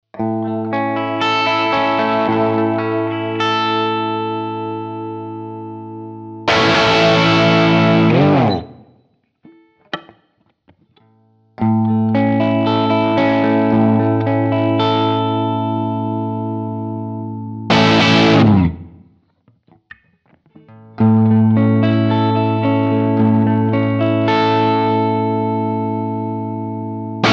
gibson les paul lpm bridge bridge-neck neck vergleich